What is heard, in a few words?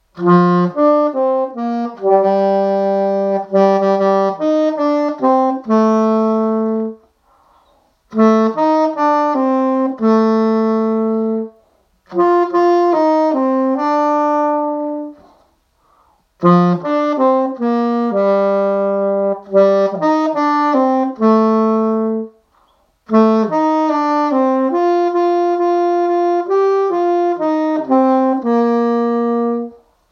Jingle, Saxophone